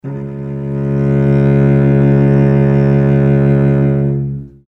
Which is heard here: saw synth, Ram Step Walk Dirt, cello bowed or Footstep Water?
cello bowed